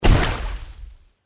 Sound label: water walk, power armor; clothing system